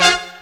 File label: HORN LICK, Hits & Brass